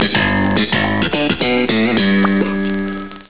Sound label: Bass riff